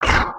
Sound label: Run, creatures organic